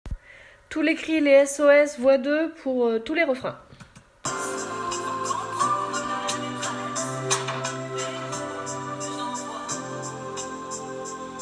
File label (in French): voix Alti Basses; pupitre alti; enregistrements de travail